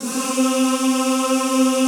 ZZZ C3, Classical Choir; Best Service; Extended Classical Choir

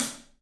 PAISTE HAT, Drums; 3D Sound

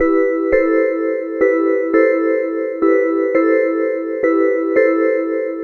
Chime Loop

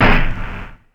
Snare, Ensoniq ASR-X Pro

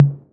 TOMS 808

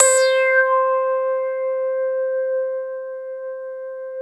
JV-1080

